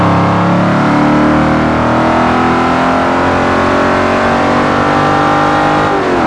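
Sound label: third, car engine; tdmcars; cayenne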